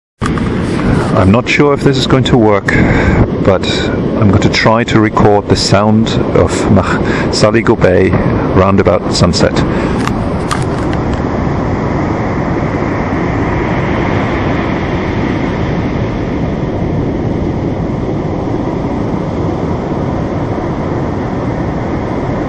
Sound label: waves breaking, islay; saligo